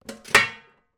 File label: bathroom pedal bin metal open; household; zapsplat